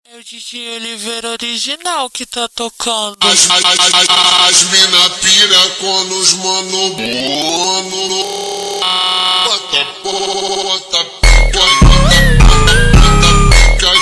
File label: Montagem slowed